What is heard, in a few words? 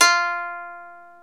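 ethnic plucks; Eth Plucks, Pluck Duo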